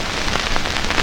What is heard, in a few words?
noise; regal; 78 rips